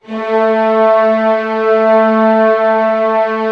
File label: orchestra A2 FF; Sound On Sound - Peter Siedleczek's Orchestra